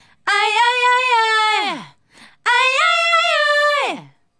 Female Group, Hip-Hop and RnB Vocals

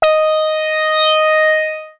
D#4, synth; E-MU Producer Series Vol. 6 – Analogia Project; Strictly Synthe1; MILTON B

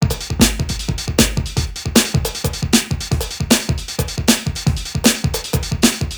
Vintage Kit, drums beats

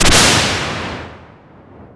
NOISE

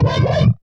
Drums; Distored Reality

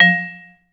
VB MUTE F2; Best Service; Peter Siedlaczek's Advanced Orchestra

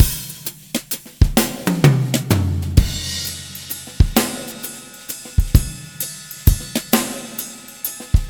drums six8 130; Quazibeat; Rock Heaven Drums

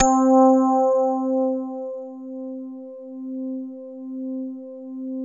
E RHODES

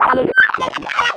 Distorted Reality; Spectrasonic; DIGITERROR

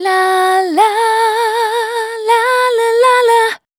FEMALE, Dance Vocals

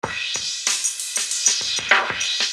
Filter Groove; Construction Kit; HIP-HOP; Sonic Foundry (Sony Creative Software)

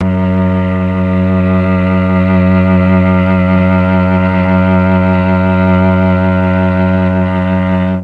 F#2 Mellotron cello; Mike Pinder - Mellotron Archive CD

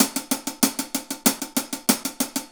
Hi Hat Pattern

